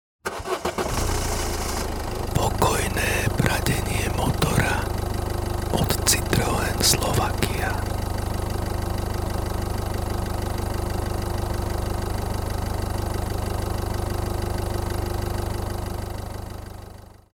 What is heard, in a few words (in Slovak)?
pokojne pradenie motora citroen